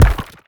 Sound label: symbol Landed